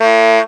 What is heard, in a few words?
honk